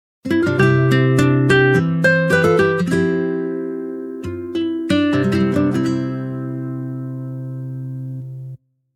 guitar, ringtones; Meizu; X8

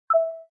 Video Record, ui; Samsung SPH-D700 Epic 4G

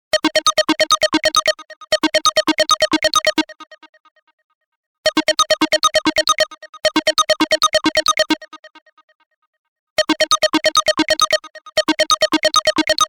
Square Wave, ringtones; Vivo X5 Pro

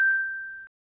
Timer; LG; CT810-Incite

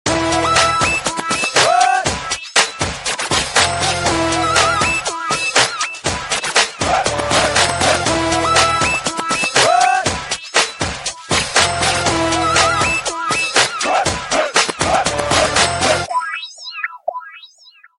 Breakbeat, Ringtones Rhythm Mix; Samsung SCH-W770

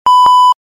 beep once; i-mobile i-style Q1

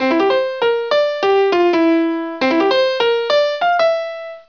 Bell Left

